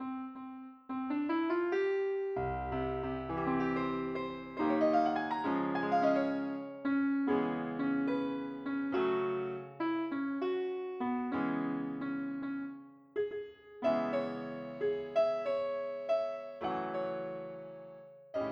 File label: piano